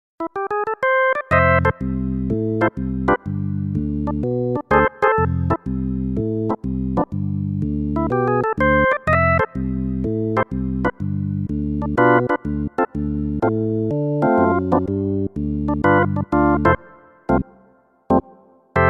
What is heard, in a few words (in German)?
Roland RD2000 Orgel